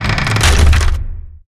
objects, World of Tanks

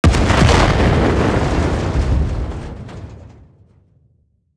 metal cistern; World of Tanks Blitz

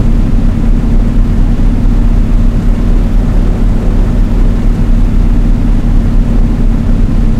blenheim cockpit r, engines; War Thunder

